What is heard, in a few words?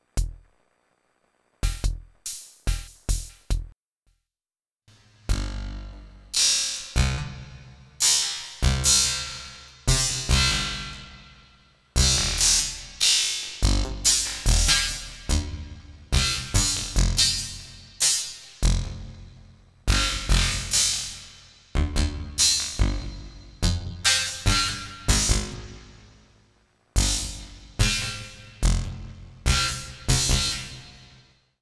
Karplus Strong Drum Beat Resonance; StarLab